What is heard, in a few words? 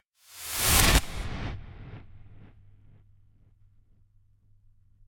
Cinematic Whoosh Transition